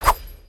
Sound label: maelstrom shot; creatures organic